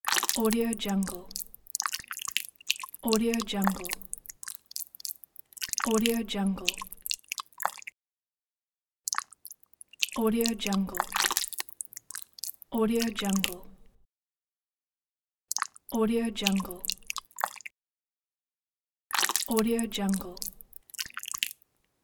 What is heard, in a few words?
entrails and gut